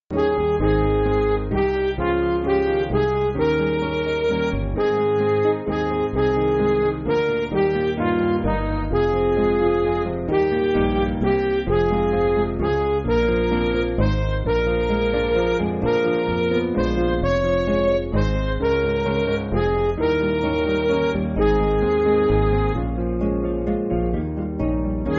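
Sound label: Midi, Backing Files for Churches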